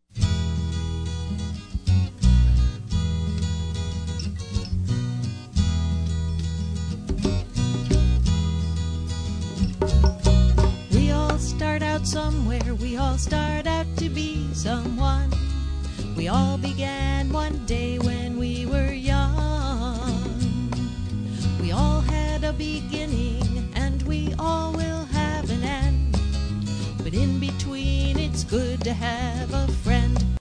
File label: Birthday Tune